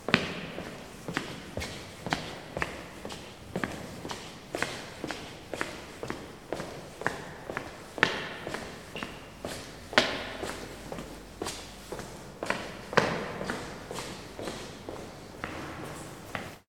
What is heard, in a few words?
Stony Hall Walk